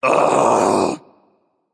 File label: ugh, voicepack; smite; jorm